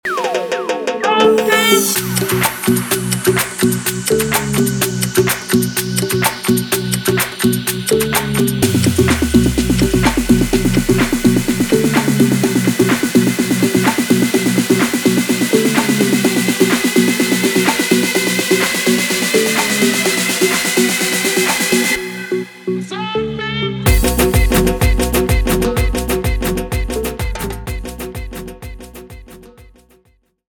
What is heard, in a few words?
intro outro 126 bpm